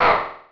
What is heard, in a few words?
pain; Alien Arena; firebrand